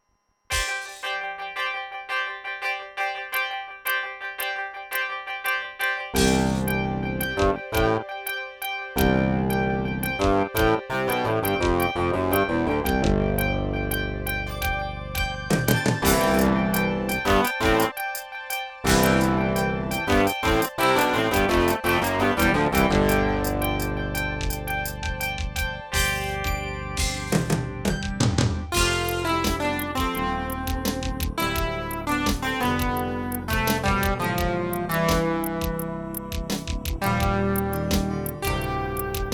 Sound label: wavestation; canyon